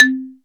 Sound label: xylophone; SN-R8-07 Mallet